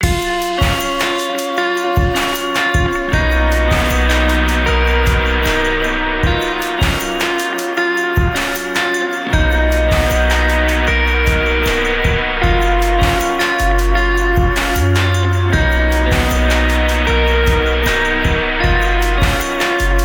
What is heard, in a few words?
Fluorescent Emotional Indie